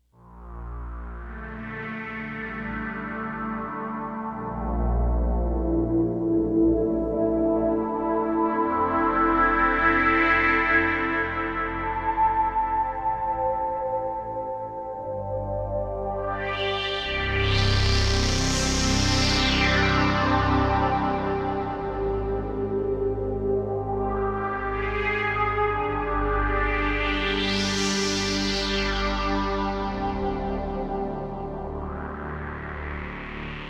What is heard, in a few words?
polysix phased strings; dss1 demos